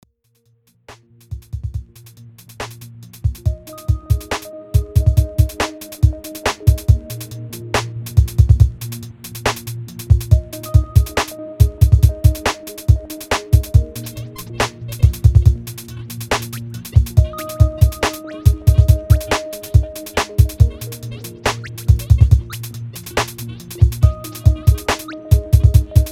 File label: relax hard bass drum; Dubstep